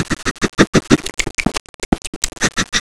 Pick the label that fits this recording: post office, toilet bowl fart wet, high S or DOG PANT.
DOG PANT